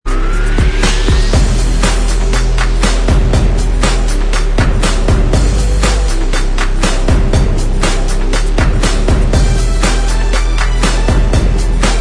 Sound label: Inst Track